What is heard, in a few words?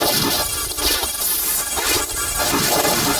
HYPER WARP; Distored Reality